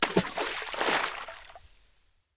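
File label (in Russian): тяжелый бросок большого камня в воду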